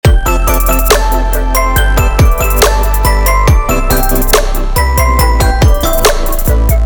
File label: harmonize VI7